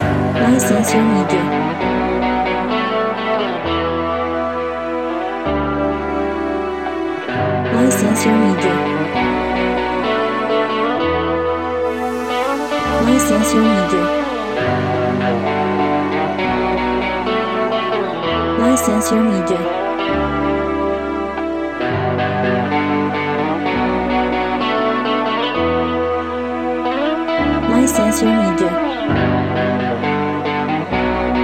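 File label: Back To School Uplifting Loop No Drums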